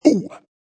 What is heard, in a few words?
Vocal Deep Ooo, Funk vocal loop sample.; 110bpm